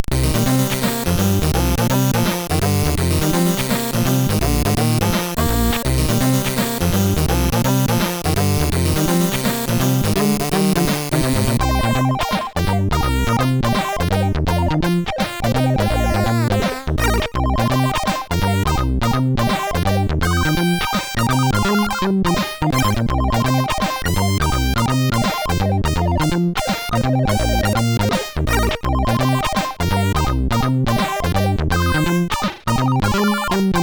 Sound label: guitaring, SIDTunes